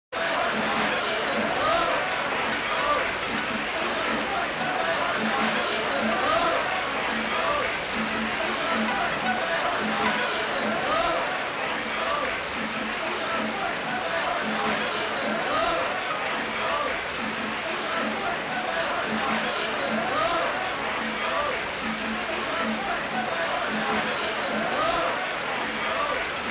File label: Stage Ambient Music; The Last Blade; Hyo Amano